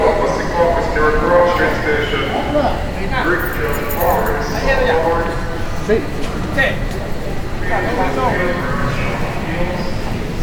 Penn St

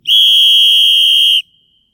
shrill whistle

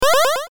powerup

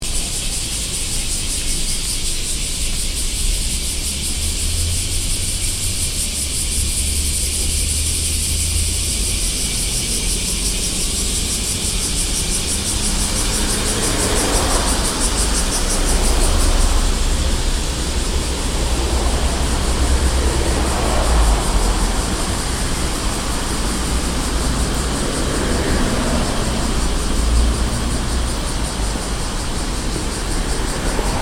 summer city

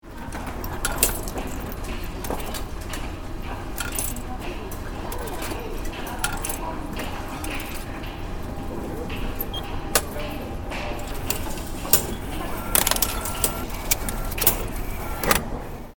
metro ticket machine

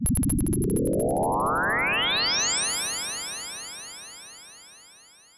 warp

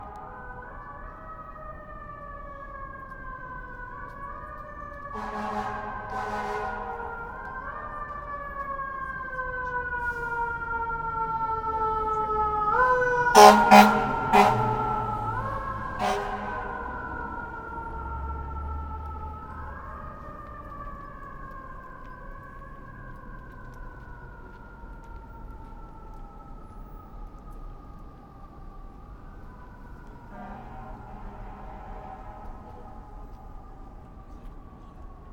FDNY

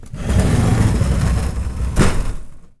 class door

